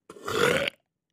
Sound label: Med Stinky Airy Belch